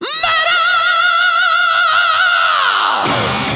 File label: Metal